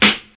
2000s rap snare sample a key, Royality free snare drum tuned to the A note; snare-drum-samples